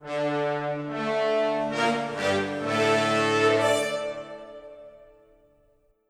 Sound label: Music Fanfare; Fable Anniversary